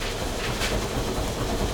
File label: eggomatic Rumble